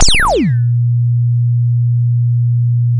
sound of a bike bell

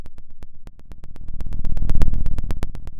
pop, Sound of a fire crackling

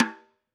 Pearl Piccolo Side Snr Off, drum

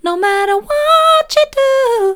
soft, vocal

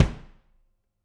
Loose Kick

